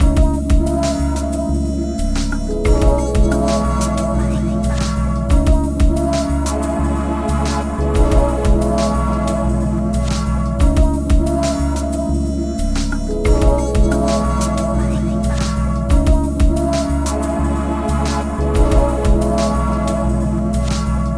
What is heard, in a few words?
Hip-Trop